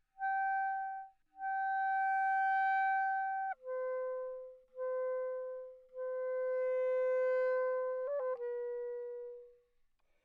piece, classical clarinet bb, Anechoic; OPENAIR

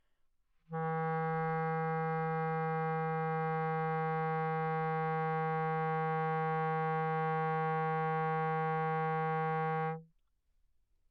low, classical clarinet c, Anechoic; OPENAIR

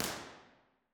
dixon studio theatre; b-format; university york

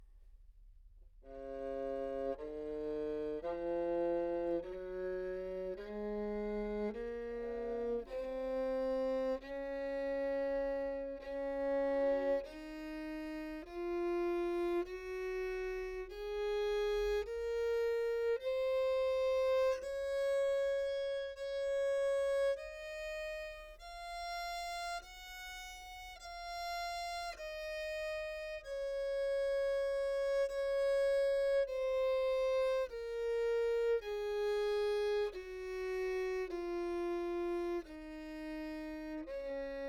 treble viol back microphone, Anechoic

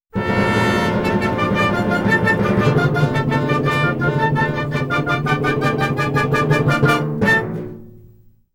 Orchestral, Anechoic; OPENAIR

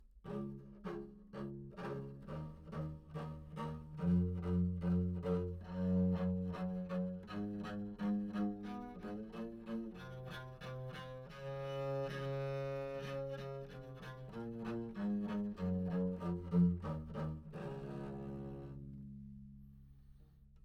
bass viol, Anechoic; OPENAIR

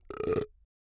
burp; OPENAIR; Anechoic

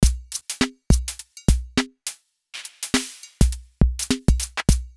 drums, synthesised drums, Anechoic; OPENAIR

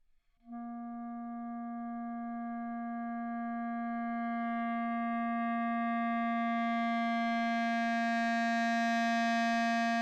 romantic clarinet bb, Anechoic; OPENAIR

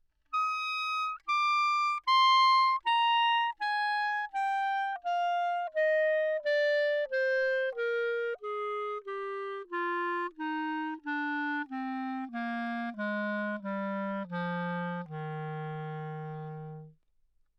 modern clarinet bb, Anechoic; OPENAIR

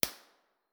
koli snow, koli national park winter

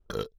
burp; OPENAIR; Anechoic

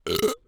burp; OPENAIR; Anechoic

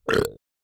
burp; OPENAIR; Anechoic

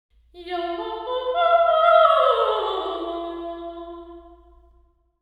sing, St Patricks church Patrington model

